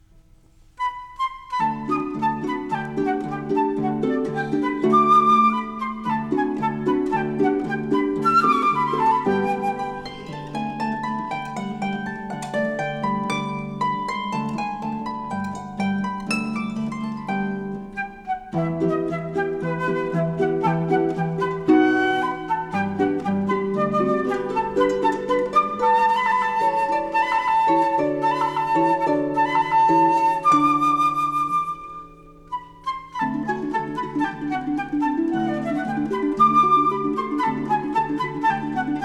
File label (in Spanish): Sonata para flauta y arpa en sol menor; Gaetano Donizetti; mov.II